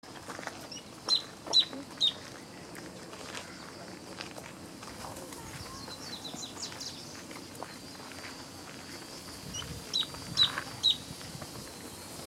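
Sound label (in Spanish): espinero pecho manchado corto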